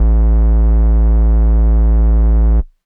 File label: Air Bass A0